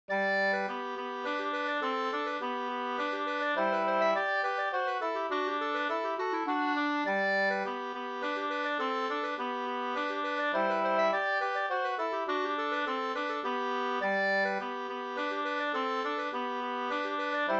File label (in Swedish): polska, 2st; Byss-Kalle